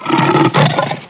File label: nemesis pain; cstrike; zombie plague